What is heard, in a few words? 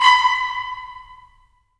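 brass trumpets staccato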